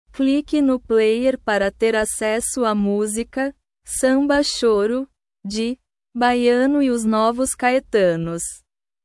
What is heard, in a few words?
samba choro